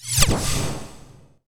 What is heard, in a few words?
Arcane Desintegrate